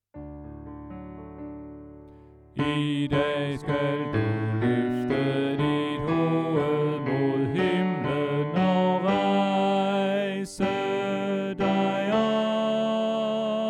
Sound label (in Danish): Tenor; oevefiler